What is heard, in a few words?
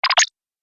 Laser Unlock; Laser Sound Sound Effects